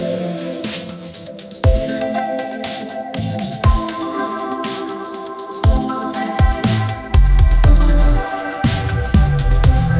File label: Chillstep, calm EDM; musicgen